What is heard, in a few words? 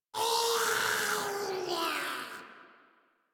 Attack Roar; en; Warframe; Grineer; Ghoul